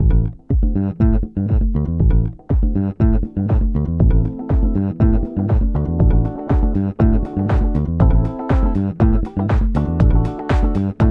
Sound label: Funky Shoes